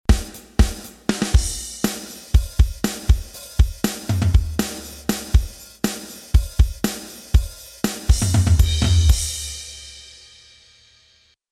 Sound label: Samplitude Pro X6 Loop DYN BassDrum